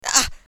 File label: Pain; Assemblee SFX